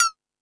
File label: House Machine, Drum Machines